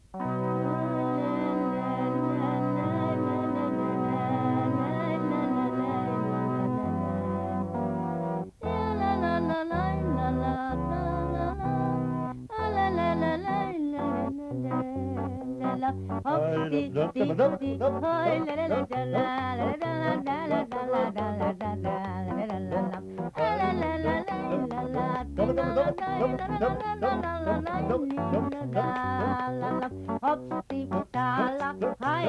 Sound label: added organ